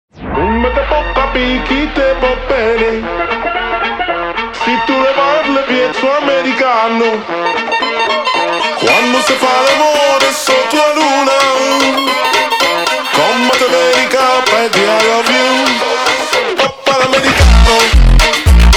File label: Americano Funk slowed